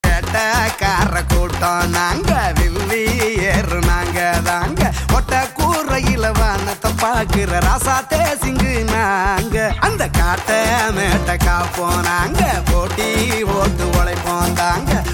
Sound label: BGM Ringtone; Jai Bhim